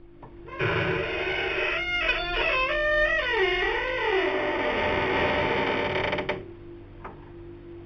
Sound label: Sqeaking Door